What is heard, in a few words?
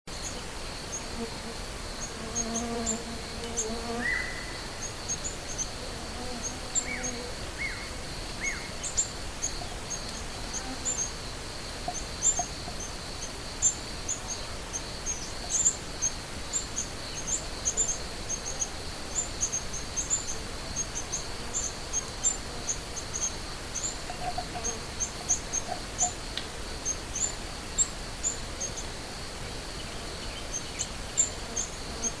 black tailed cisticola calls